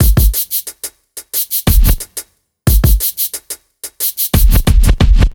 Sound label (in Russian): сэмплы битов хип хоп